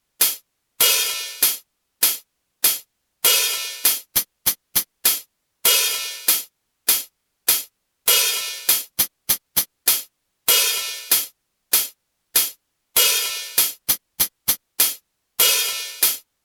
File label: Hi-Hat